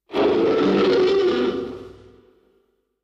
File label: roar; godzillasaurus